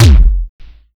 DUTCH KICK; Masterbits; Soniq Elements

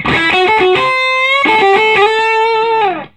Vintage Blues Guitar, SOLO

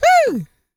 YELL, MALE HUMAN; Spectrasonics Vocal Planet

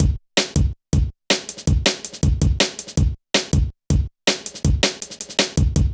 Kicker, Jungle, Drum Loops 161.5

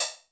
Korg 01W Dance Kit; 300 Drum Machines

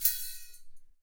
METAL; ILIO; Ethno Techno; BEDROCK 126

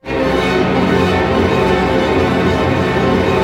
STR ARP DIM, Symphony Orchestra; Roland LCDP08 Symphony Orchestra; STR Arpeggios

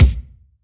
BD, BASS DRUMS; EdgeSounds

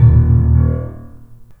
THUD; InVision Interactive

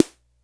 KORG SR120

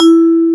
E-3, VIBRAPHONE; AKAI S-Series CD-ROM Sound Library VOL-7

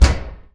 CLOSED, household; AKAI Anime Sound FX

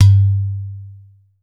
udu

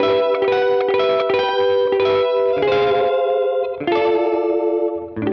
Gretsch Guit 90 E, Loops n Lines, 90bpm

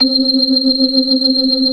BLOOD OCT R, ORGAN; Keith Emerson Signature Series

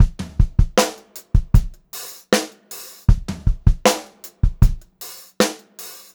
Funk-Groove Drumloops, 78 STRVAR; USB Soundscan vol.38; AKAI

